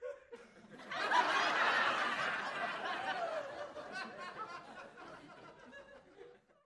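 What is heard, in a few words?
Crowd 50 Single Hearty, small interior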